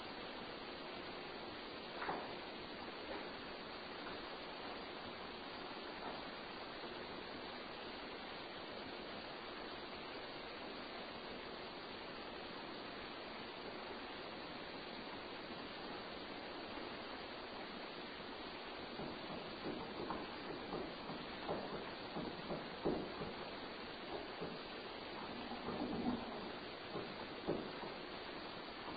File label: footsteps and movement